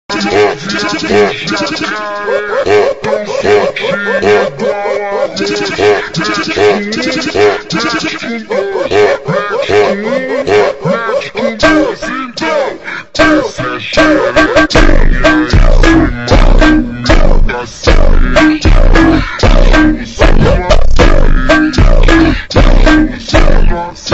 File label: beat porradeiro super slowed